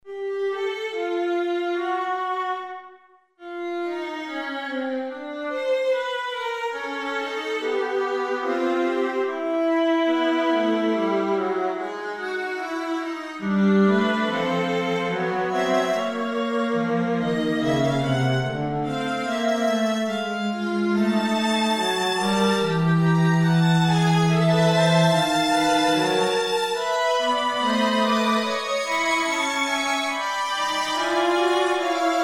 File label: training fugue; newcentury